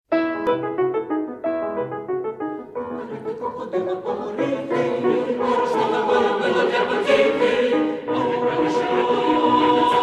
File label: instrumental